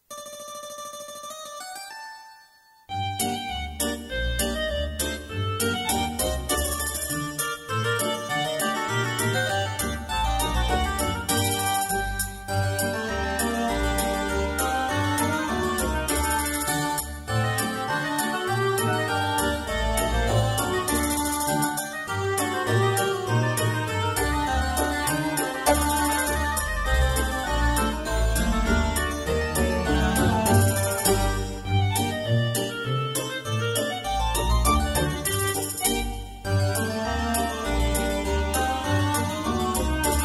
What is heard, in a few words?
Klezmer